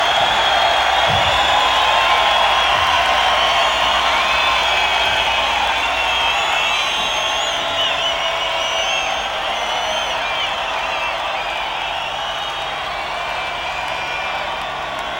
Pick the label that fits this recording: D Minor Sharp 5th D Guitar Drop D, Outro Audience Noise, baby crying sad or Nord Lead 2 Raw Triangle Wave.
Outro Audience Noise